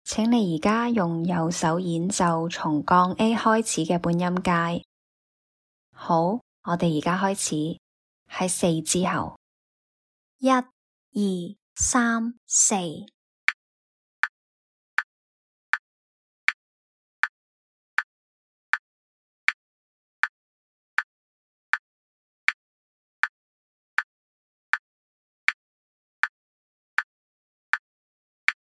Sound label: chrom scale a flat rh